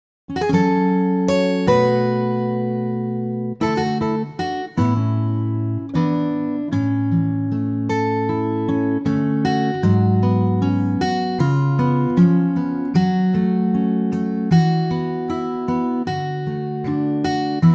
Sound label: A acoustic guitar, female; vocal range - A to A; Very lovely acoustic guitar arrangement